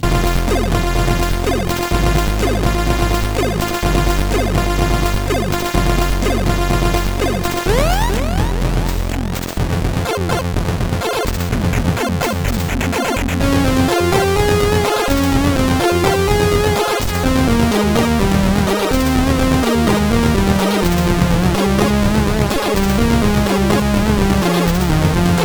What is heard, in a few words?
Music-Disk tune